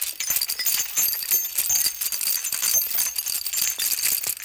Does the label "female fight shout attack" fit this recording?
No